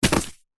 household mail bills greetings cards pile land floor post; zapsplat